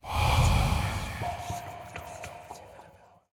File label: Lore Tablet Activate